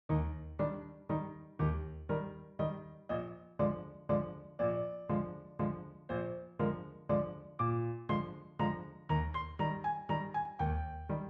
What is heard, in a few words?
valse animato